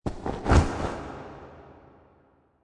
Stab Reverb, Horror - Forceful stab, arm movement with heavy reverb, as if; Stab And Slice Sound Effects; stabbing vampire in the heart in a large dungeon